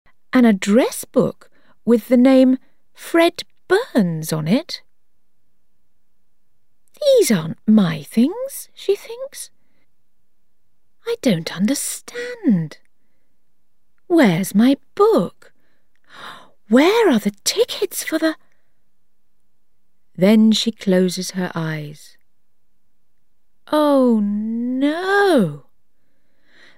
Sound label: Power Guitar